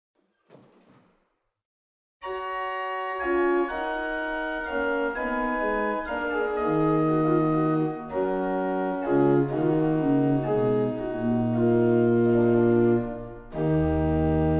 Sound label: Principal 8; waltershausen